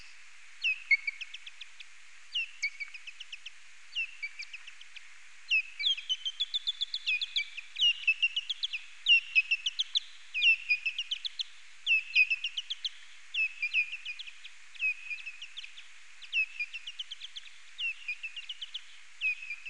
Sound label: duet